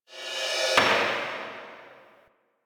PvP Countdown Message; DE; Warframe

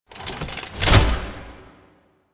Necramech Ranged Spin Attack End; Enemies; Warframe

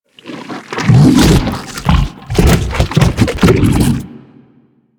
Alchemy Lab Helminth Feed; Warframe; Hub; Liset Alchemy Lab

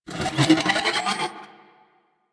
Gun Reload; Warframe; Thano Tech Arch Gun

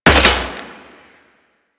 Sniper Rifle Mech; Warframe; Tenno

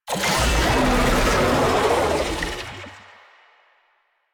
Infested Oni Vocal Idle; Warframe

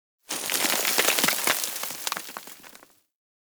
Generic Explosion Rocks Medium; Warframe; Explosions; Surface Hit Effects

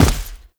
Heavy Step, Footsteps; Enemies; Foley; Warframe; Tau Dax

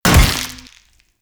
Pit Object Impact; Warframe; Infested

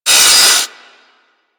Metal Impact, Weapons; Warframe; Tenno; Miter; Hit Effects

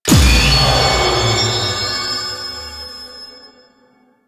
Enemy Spawn

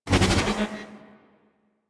Gun Beam End; Warframe; Thano Tech Arch Gun

